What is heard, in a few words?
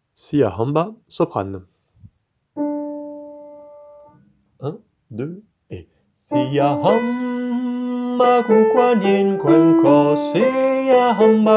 Siyahamba Soprane; Folklore Zoulou; Traditionnel